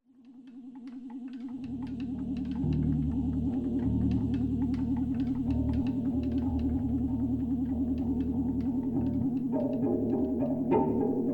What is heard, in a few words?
insect groove